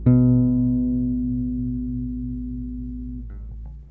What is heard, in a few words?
Bass